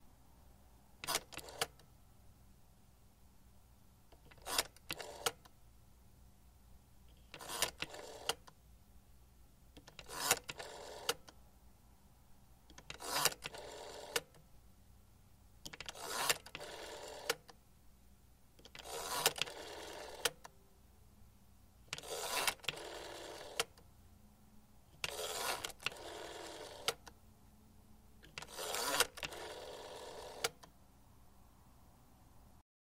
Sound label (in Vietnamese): tieng quay so dien thoai ban co dien